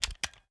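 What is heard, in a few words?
pistol reload fast extended mag end; gmod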